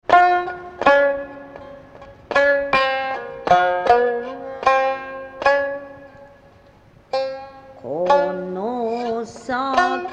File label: Shamisen